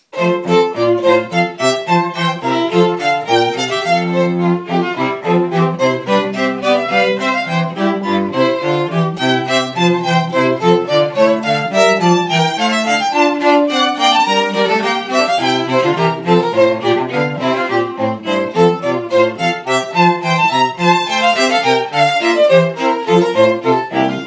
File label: bourree, quartet